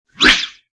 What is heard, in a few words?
boss cog wipe; Toontown Online